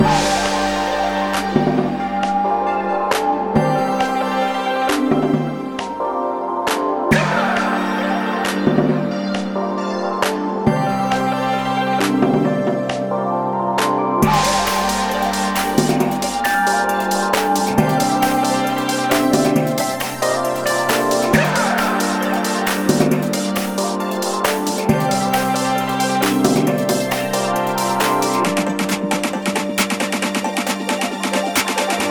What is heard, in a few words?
Minigame; Born of Bread